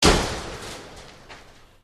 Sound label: door